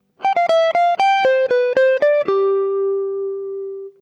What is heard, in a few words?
Chorus C, джаз